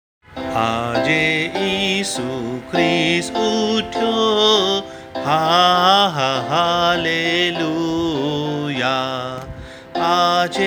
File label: Easter Hymn